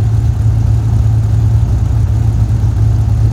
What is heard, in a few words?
Dodge Challenger Idle